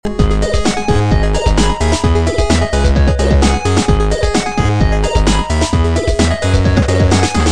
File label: Chiptune